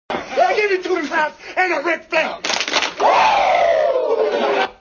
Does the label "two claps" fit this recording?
Yes